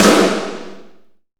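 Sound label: Kit Heavy snare; CMI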